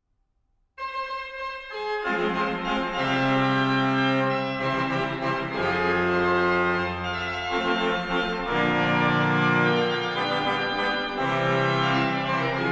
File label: Magnificat Dialogue; Dandrieu